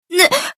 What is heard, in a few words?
scared